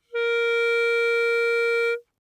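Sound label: Eb Clarinet fortissimo Bb4 stereo; Woodwinds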